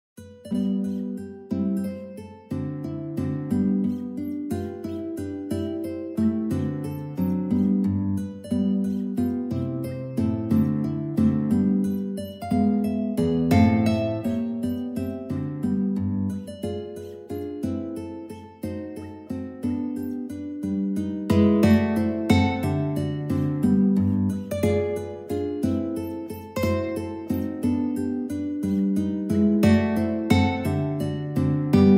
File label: prelude, guitar